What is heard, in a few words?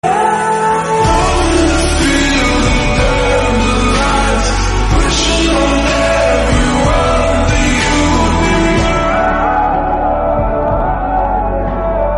slowed reverb english song